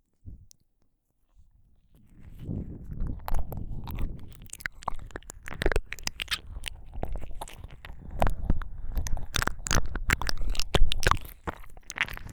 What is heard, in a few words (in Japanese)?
耳舐め(両耳舐め）, ASMR